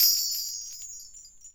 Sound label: BELLTREE